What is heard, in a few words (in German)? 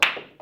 billard kugel kugel